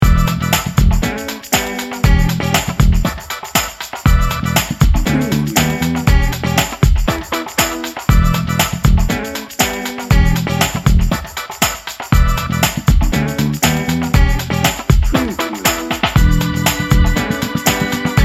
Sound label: funky lickin good